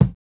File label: Kick, jungle breaks drum sounds